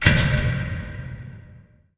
mall random, ambient

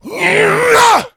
rage, infected, male

